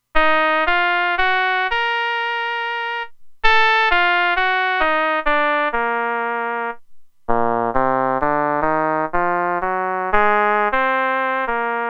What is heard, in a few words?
Oboe, Roland Alpha Juno-2; Original Sound Chart patches